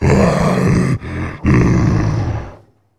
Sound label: alert, male zombie; nmrih; zerosiki; slender